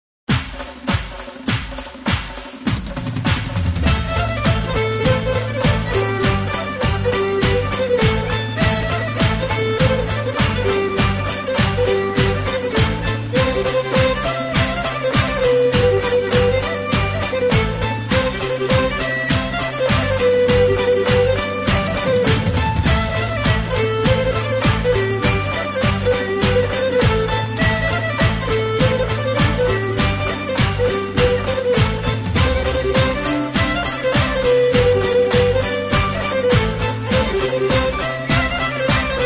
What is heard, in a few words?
Lezgi instrumental